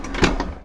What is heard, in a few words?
Drawer Close; Timelock VR